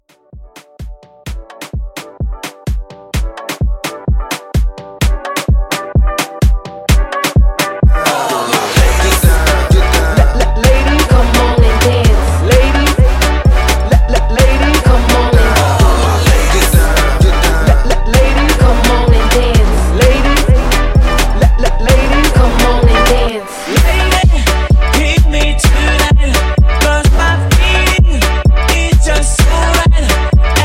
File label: Afro Hype 128